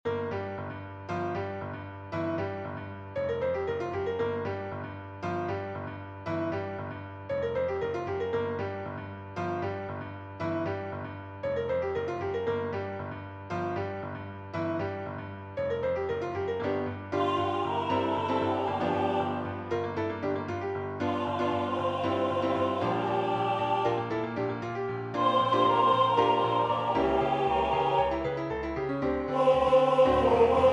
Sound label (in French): choeur et piano midi